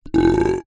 human burp deep; zapsplat

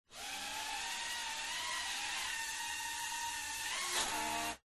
Drone; fox audio

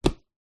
sport beach soccer ball catch

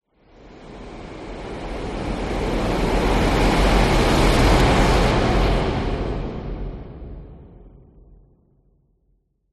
whoosh; orlando lanzini

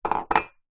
mug cup ceramic set down kitchen worktop; household

